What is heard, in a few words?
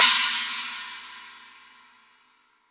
China 16, Roland SPD-S